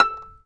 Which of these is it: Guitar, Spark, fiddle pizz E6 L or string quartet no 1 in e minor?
fiddle pizz E6 L